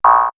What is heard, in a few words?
synthfx; Rabotnick; X-Static Goldmine